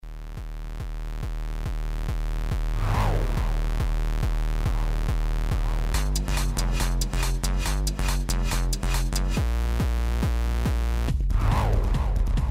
buzz beat

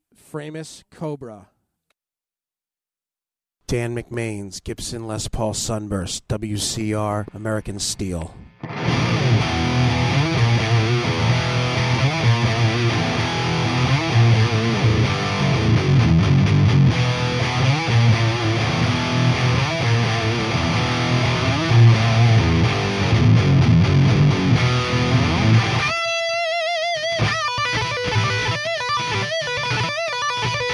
framus cobra bridge

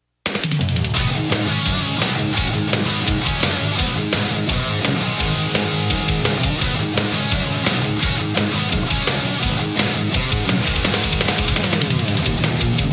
splawn

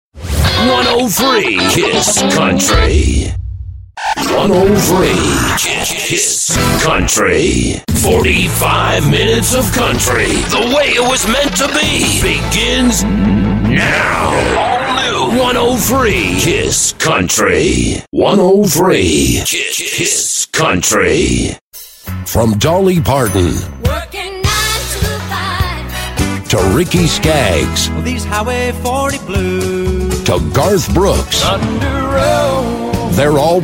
imaging country

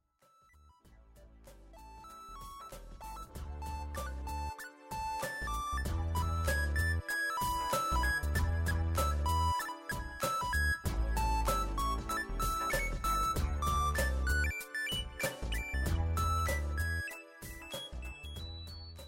computer page music

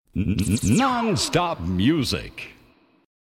non stop music

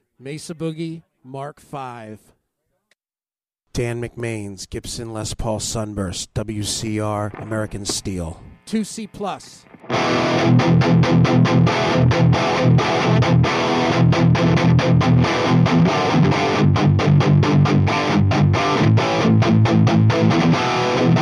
mesa boogie mar v bridge

